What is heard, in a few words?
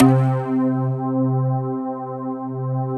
SYN K-2000, Roland